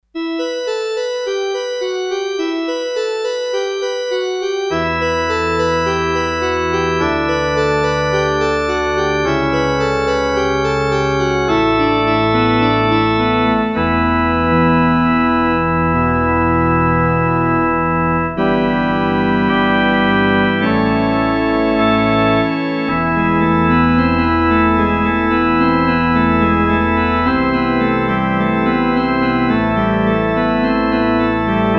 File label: Hall Organ, Roland D-10; Factory Patches